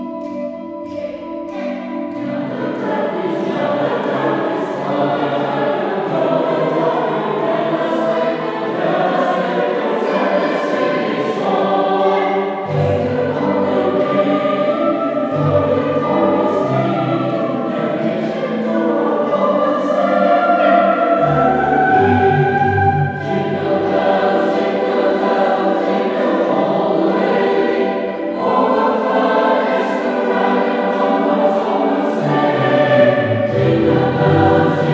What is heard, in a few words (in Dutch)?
Jingle Bells, Koor